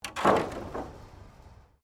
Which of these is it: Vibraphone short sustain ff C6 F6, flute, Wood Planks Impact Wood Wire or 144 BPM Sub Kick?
Wood Planks Impact Wood Wire